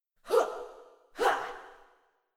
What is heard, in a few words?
Princess Attack VOX